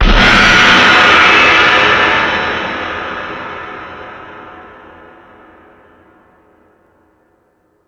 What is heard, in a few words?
Space Drums, Drum Machines